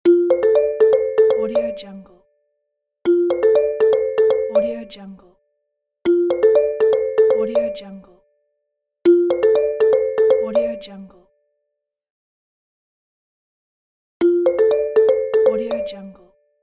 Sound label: mobile phone ringtone; interface sounds